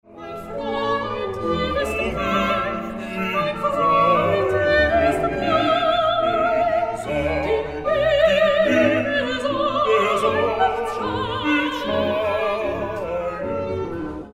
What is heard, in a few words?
mein, choral de bach, cantate